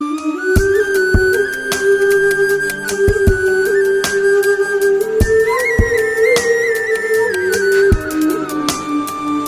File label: Love Bgm